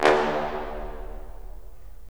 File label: BRS, Roland LA Composer